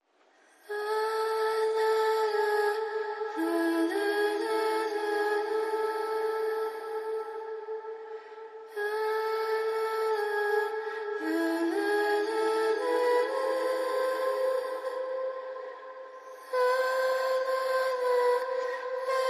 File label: Vocals Only